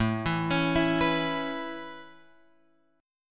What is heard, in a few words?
A Major Add 9th A, chord; Aadd9 Chord {5 7 X 6 5 7} arpeggiated